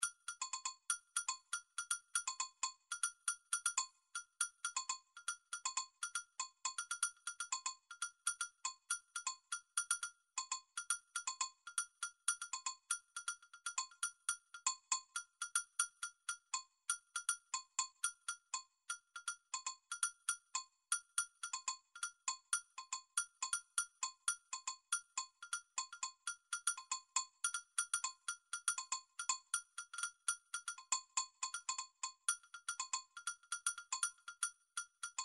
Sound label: midi drums percussion agogo; Midi Vol 4 Drums & Percussion